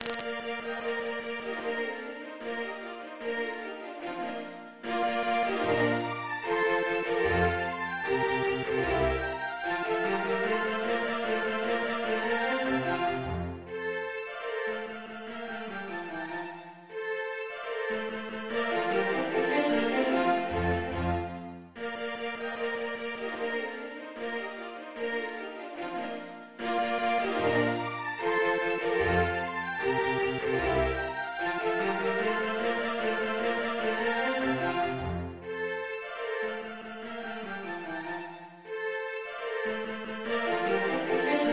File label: String Quartet in Bb major; MIDI; Haydn; Hob Ⅲ-1=5